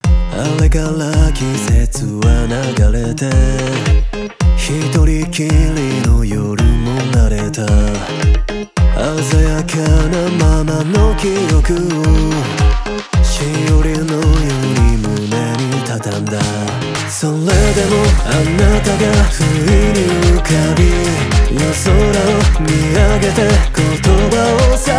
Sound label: vocal